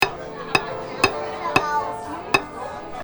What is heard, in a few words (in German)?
klangsafari marmortisch; familientag